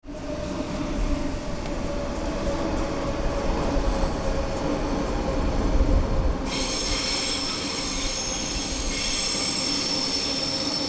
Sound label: Suburban metro running and arrival noises